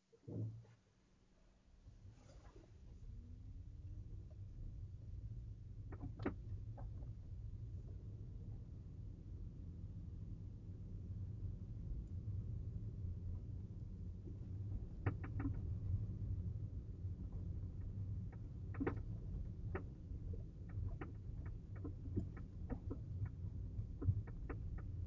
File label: Prius; 2020